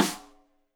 Rim Shot Stereo; Drum; Deeply Sampled Drums; SMDrum

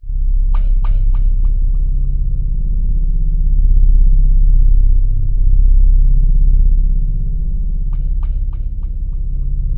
C2, Synth Leads

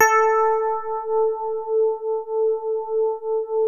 DX7 HARD A4, E-PIANO; Music Store

